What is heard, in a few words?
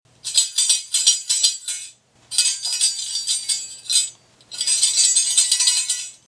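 shaker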